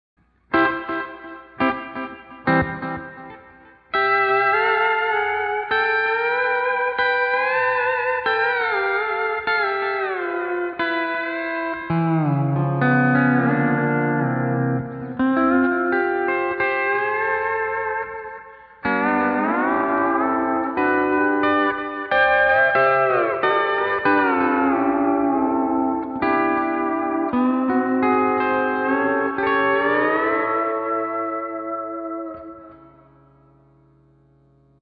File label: Delay; ToneX Pedal